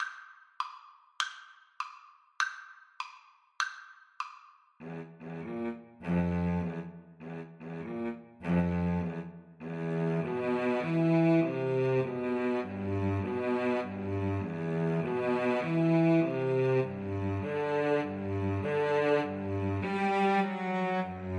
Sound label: Cello Duet